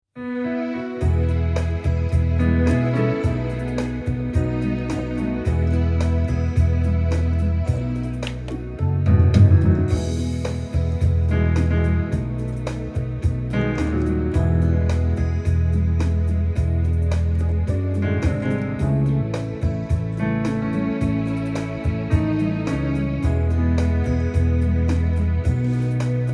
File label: rumba sample